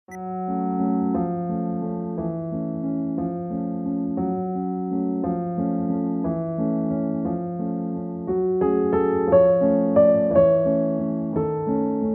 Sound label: piano